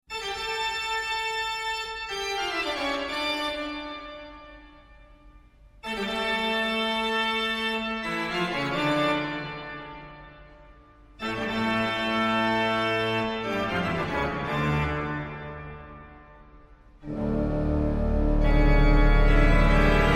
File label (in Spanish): Toccata y Fuga; honduras musical 8vo grado